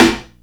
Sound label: SNR, Snares